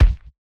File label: Kick